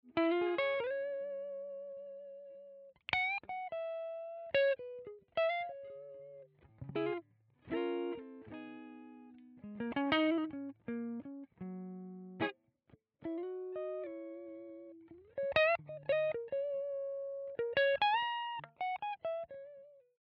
clean, guitar; LLV 55 EVILTWIN

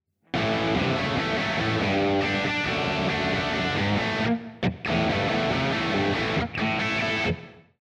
dark crunch, guitar

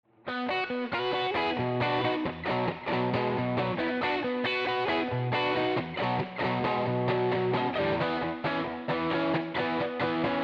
dirt driven, guitar; LLV 55 EVILTWIN

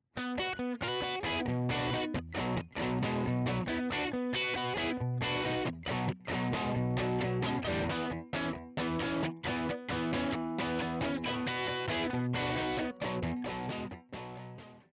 dirt, guitars; LLV 55 EVILTWIN

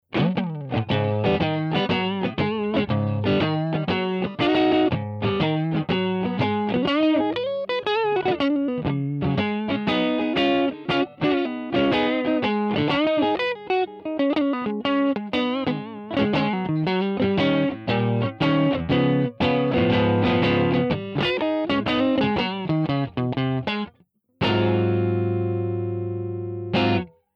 snappy, guitar

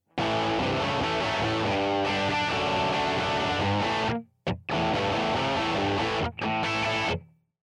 dirt, guitars

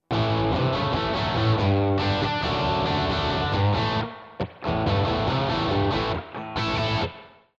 dirt fx, guitars; LLV-52 PRINCE TWD

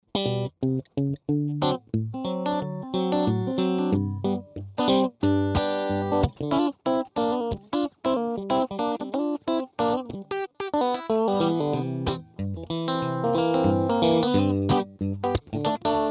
bright; LLV-52 PRINCE TWD

